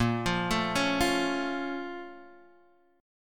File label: Bb Minor Major 7th